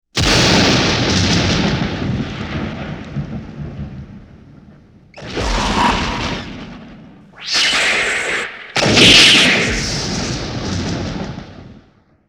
Sci-Fi Lightning Storm; Sound Design

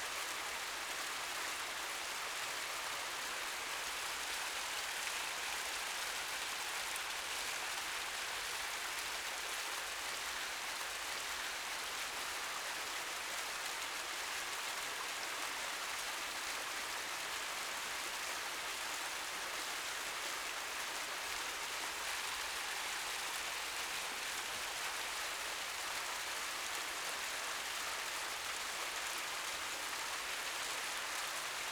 Waterfall Medium; Natural Elements

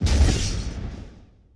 WWII Small Blast; Period Combat

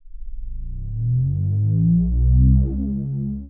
Power Up, Sci-Fi; Sound Design

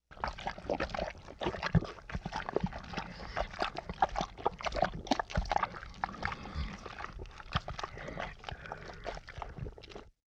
Bubbly Slime Ingestion, Horror; Sound Design

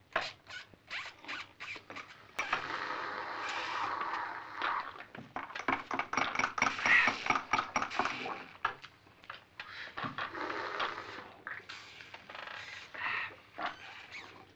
LoFi Group Soda Suck; Vintage Cartoon; Comedy Vocals

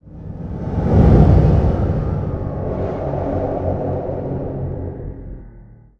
Whoosh Synth Composite, Horror; Sound Design

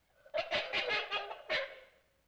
Raspy String Can Pull; Vintage Cartoon; Foley

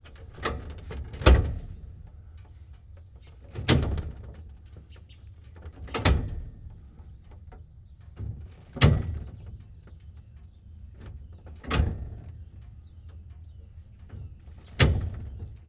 1924 Ford Stake Bed Truck Gear Shifts Dry; Period Vehicles

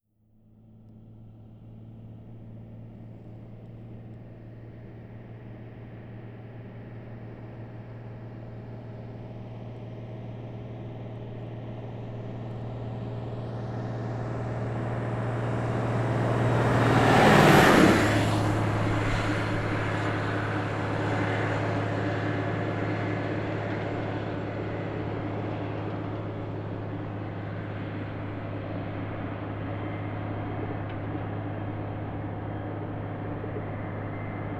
18 Wheeler By 55MPH; Cars & Trucks; Vehicles

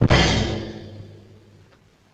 Bass Drum Cymbal Hit; Vintage Cartoon; Drums & Percussion

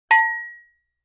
Cowbell High; Vintage Cartoon; Drums & Percussion

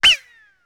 Ricochet & Whiz By Single

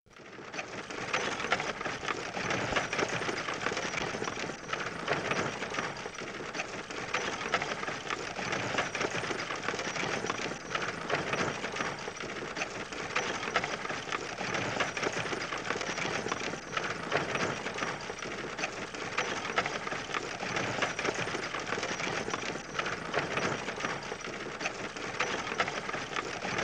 Wagon Steady, Period Vehicles

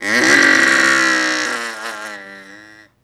Bronx Cheer; Vintage Cartoon; Comedy Vocals

